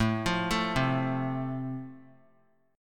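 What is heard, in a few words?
A Minor Sharp 5th, chord strum; Am#5 arpeggiated; 21,24,24,22; JGuitar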